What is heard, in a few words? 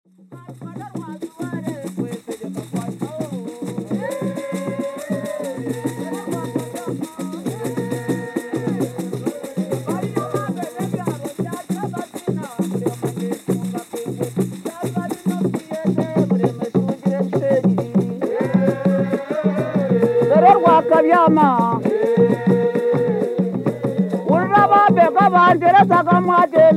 Enyimba, instruments uganda